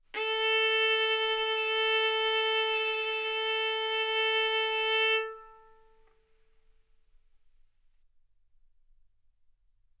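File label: Violin arco mf A string A4 B4 stereo; sul A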